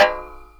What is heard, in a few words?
Percussive Hit, contact mic